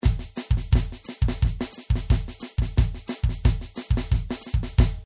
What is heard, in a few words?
batucada caixa with bass drum